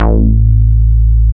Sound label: BASS